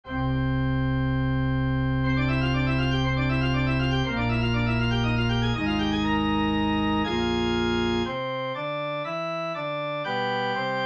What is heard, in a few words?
bass; Easter 2020